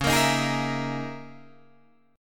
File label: Db Minor 6th Add 9th Db down Guitar DADGAD